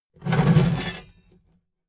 metal scrape big phase

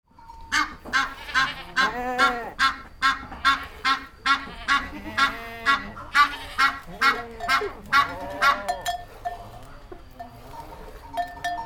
goats and geese farmyard

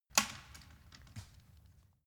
wood limb crack big

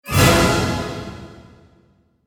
orchestra hit

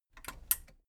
metal button radio push power off

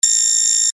control beep

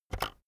keyboard external enter press very fast

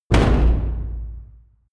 large damper

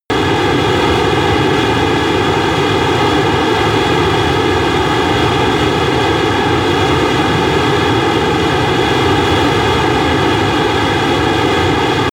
military jet fighter steady noise

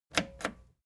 record player play click slow

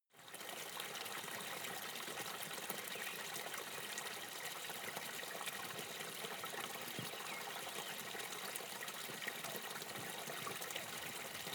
river ripple close birds

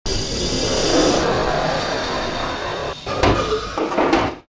hydraulic door

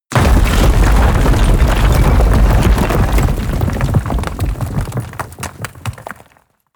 large roll drop

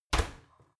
oven close

